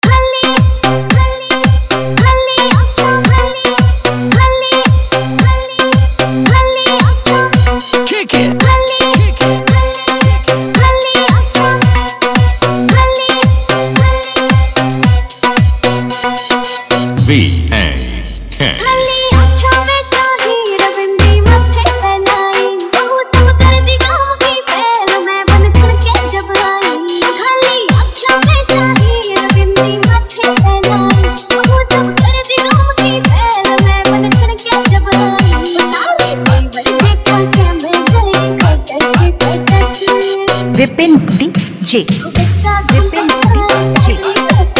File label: Haryanvi dj song